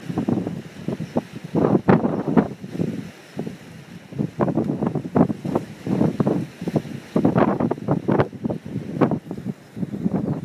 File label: Wind outside